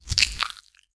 short punchy sfx biting i, fruit

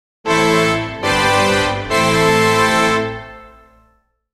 a proud musical anthem fo

